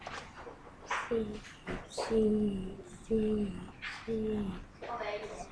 sea

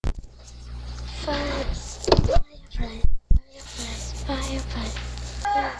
fireplace

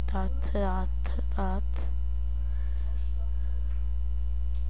knock

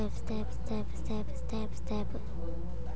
stab

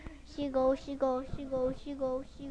seagull

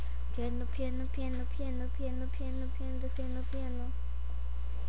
piano

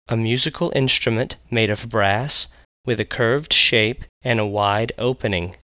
saxophone

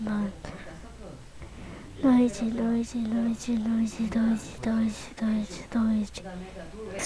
noise

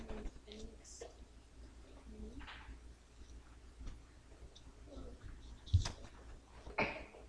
glass

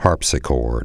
harpsichord

